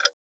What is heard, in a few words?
clock